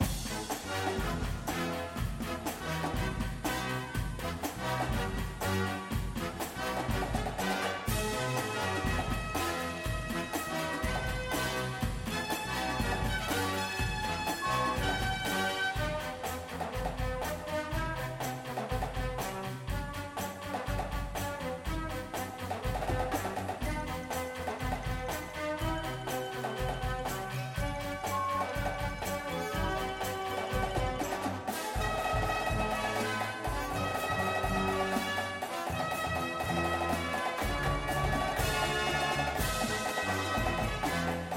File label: snare with music, drumline